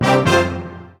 Checkpoint; Dub Dash

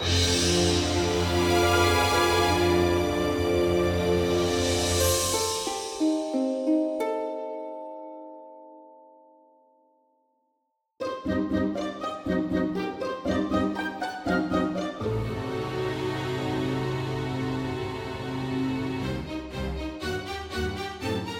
Success; Q T E Saving Altair; Tintin Reporter - Cigars of the Pharaoh

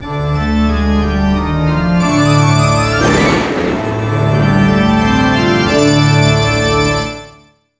Horror; ripened tingle s balloon trip of love; nintendo ds

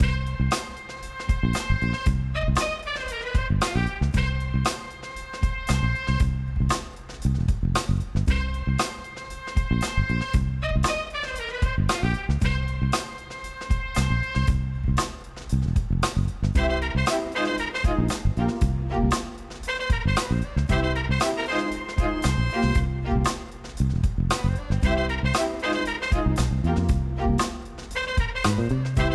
Jazzy Lounge; Club Penguin Original Soundtrack

